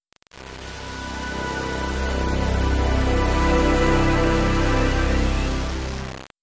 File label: Shutdown; Windows Never Released Soundpack 1; deviantart